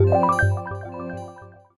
Jingle Stage Clear; super rub a dub; ps3

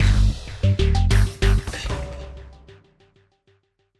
Jingle Shark Start; super rub a dub; ps3

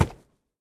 Wood; Minecraft: New Nintendo 3DS Edition

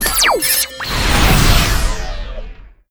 Lightningspear Lockon; gamerip

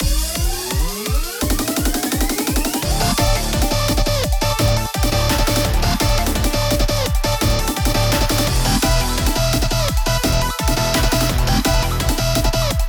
Battle Pinch

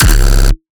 Hardkick Gun B; exciplex sample presets pack; JinoBeats

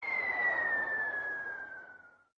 Let Fall

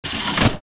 Slots slot touched; SFX; The Binding of Isaac

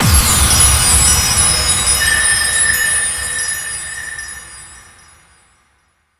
Congrats; beatmania IIDX 29 CastHour; System BGM

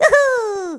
Juhu; Fitness

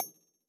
Pick Up Styxcoin

